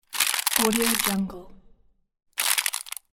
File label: crumpled plastic junk